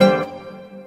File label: dunk, Piano